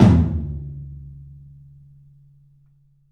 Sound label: TOM, Low Toms, 3D Percussives; E-MU Producer Series Vol. 5 – 3-D Audio Collection